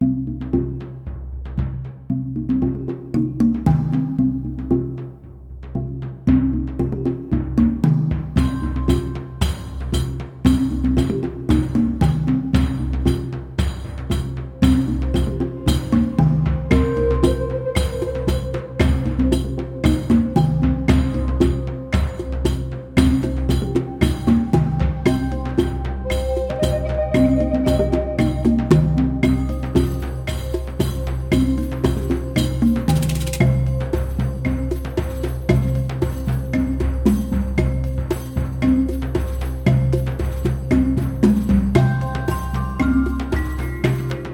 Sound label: Course Forest Upbeat; Sports Story